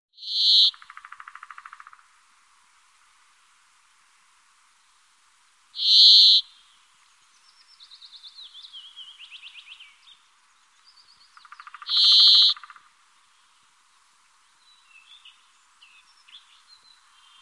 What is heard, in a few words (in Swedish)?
Bergfink, Faglar